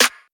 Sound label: clap sample c sharp key, Royality free clap one shot tuned to the C# note. Loudest frequency: 4057Hz; single hits one shots